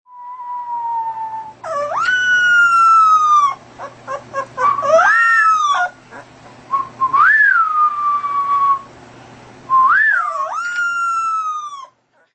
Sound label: puppy howl